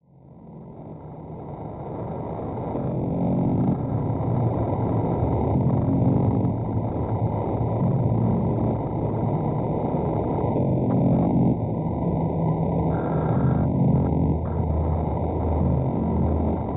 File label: strange noise fade; not used in game; apartament 1406